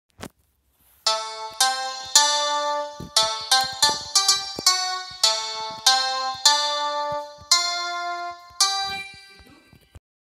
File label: bgm piano; gilli